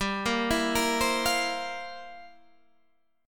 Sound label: F Major 7th Suspended 2nd Suspended 4th, G, guitar